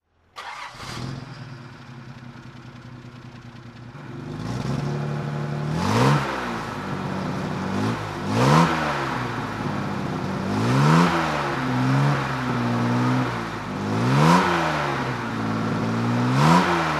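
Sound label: jaguar xk150 34S throttle, Engine sound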